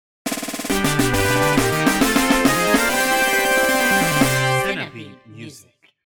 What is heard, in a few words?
complete destiny fanfare